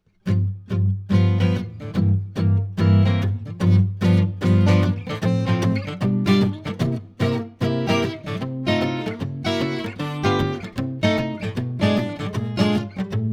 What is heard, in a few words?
Jazz, Guild12; Jazz Blues; Standard, in G